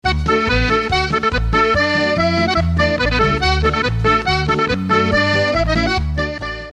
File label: polka